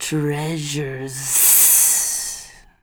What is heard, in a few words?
Snake